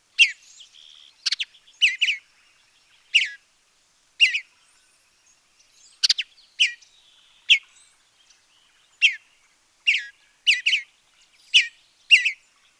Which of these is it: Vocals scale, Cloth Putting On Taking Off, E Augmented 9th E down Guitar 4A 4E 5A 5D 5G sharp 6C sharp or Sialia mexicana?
Sialia mexicana